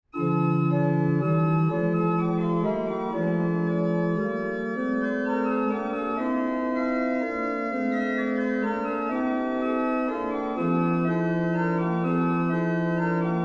invention d minor, organist; steinsland; organist online recordings

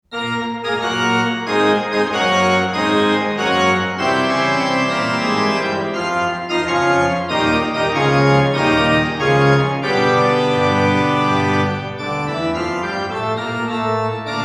postludium b flat, organist

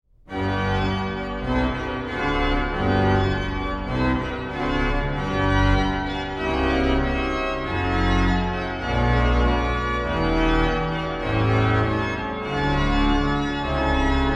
praludium g, organist

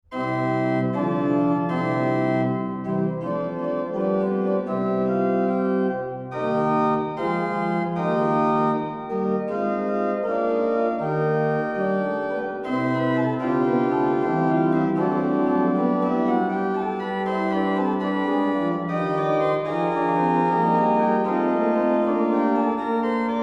praeludium manualiter in c, organist; wachinger; organist online recordings

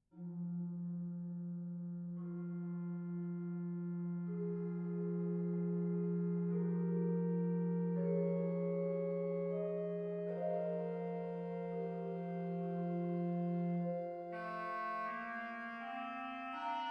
chorales, organist

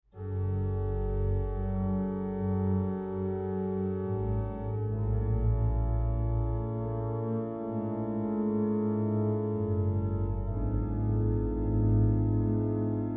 meditation on a ukrainian folk song, organist

